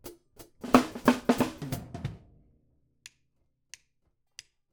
Hat

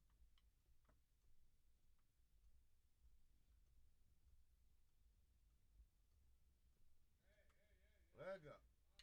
Drums

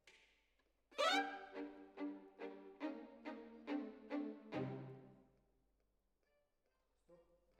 Violin Solo

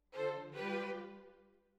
Violins Over

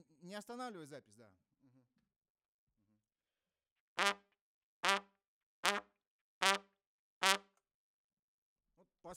Trump

